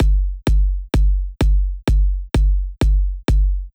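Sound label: Drum Loop 128BPM bass drum G#; VEC5 Drumloops; VEC5 Multi Loops; Loop Elements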